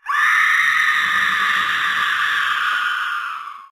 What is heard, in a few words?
Long Scream; Dracula